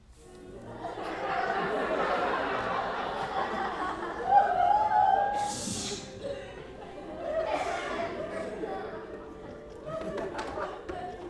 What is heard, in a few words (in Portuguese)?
Apresentacao palhaco com musica, risadas, criancas reagem ao palhaco; multidao